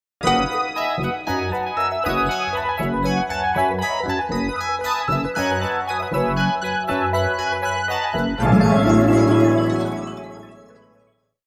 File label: Cosmic Jazz